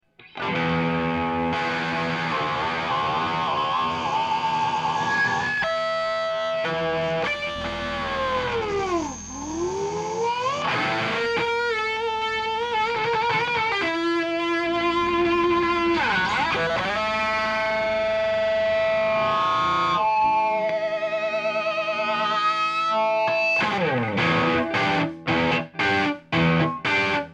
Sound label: el34, amp head; DIY